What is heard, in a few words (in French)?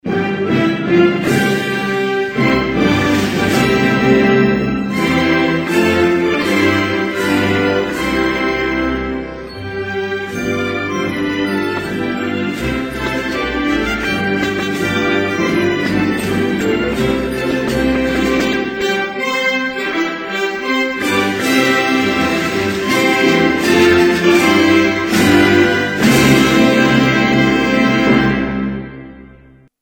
Finlande, Hymnes nationaux